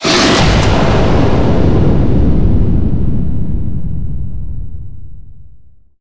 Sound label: Metro Stinger; Shadows of the Damned